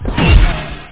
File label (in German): Rakete; Crossfire Demo